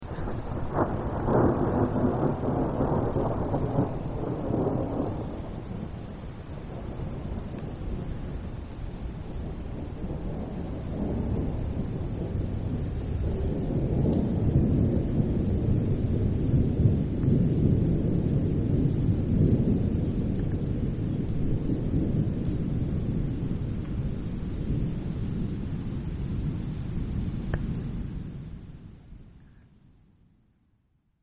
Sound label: distant thunder